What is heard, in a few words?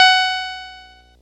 F sharp 4; harpsichord